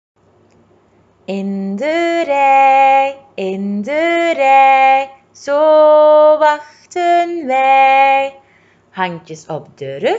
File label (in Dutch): liedje; liedjes en versjes